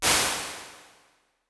Roomy Hall; Convolution; Big Rooms; IR Samples; NI Kontakt 5

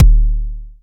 analog attacked, Kickbank

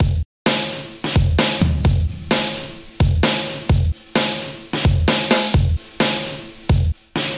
Balls 175bpm, MIDI Drum; Ableton; Factory Packs

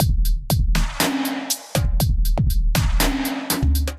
One Hit Kit, Drums, Hybrid; Ableton; Factory Packs; Drum Essentials

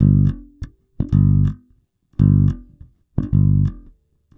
110 Pop Basic Bass G, E-Bass; Pop Rock

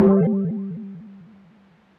Quick Spread (Press Keys), Tree Tone Presets; Ableton; Factory Packs; Inspired by Nature by Dillon Bastan

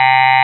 android, Digital Synths

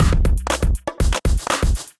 Kit, Drums; Kindified Kits

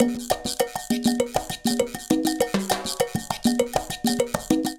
Salsa 200bpm, Latin Percussion; Ableton; Factory Packs

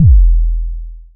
analog tonal, kick; Ableton Foundation FreePacks

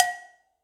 Cowbell Mid BigPunchy; Drum Hits; Ableton; Factory Packs; Session Drums Club; Standard Hits

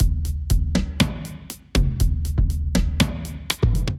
Kit, Drums Acoustic; Borella Kit

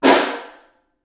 SN Far, Snare

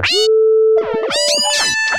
Broken Synth

